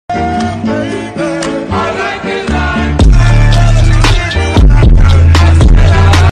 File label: ringtone